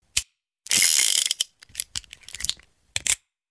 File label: Revolver Reload; Weapons